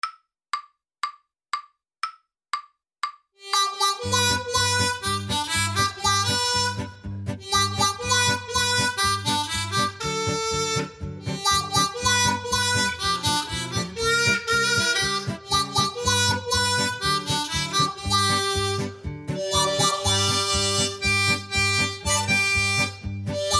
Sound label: harp